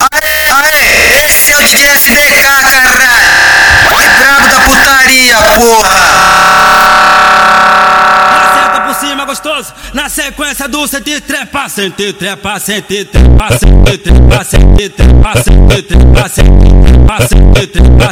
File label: montagem beat surreal